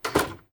door close

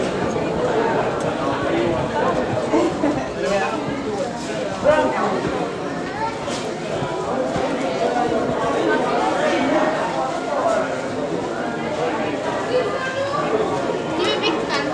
fast food joint, ambience sounds

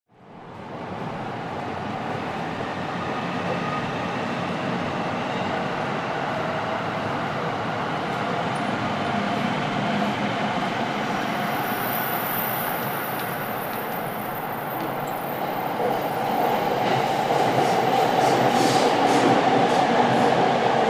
subway train

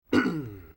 clearing throat

